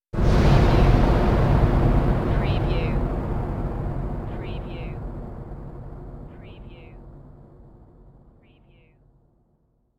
Massive Space Impact

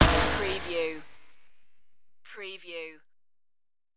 dirty futuristic impact

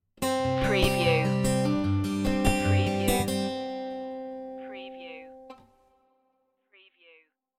sunny woods stinger

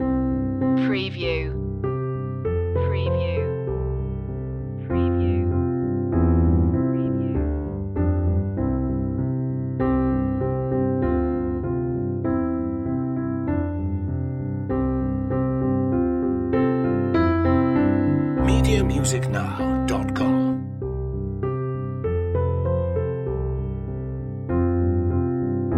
instr loop

